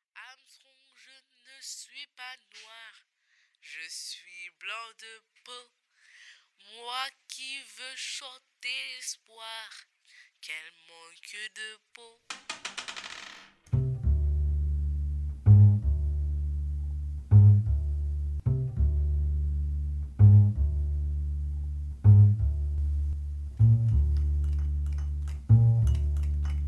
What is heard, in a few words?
Djani jazz